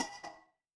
glass ceramic

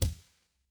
impact punch

